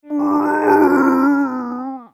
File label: Yeti death